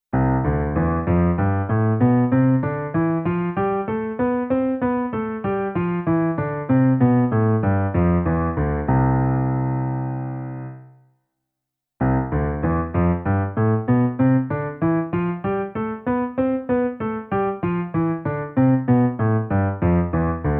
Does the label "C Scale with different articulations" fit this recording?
Yes